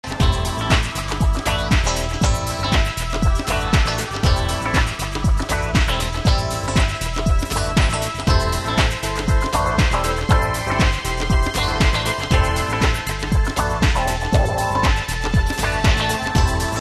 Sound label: funky 100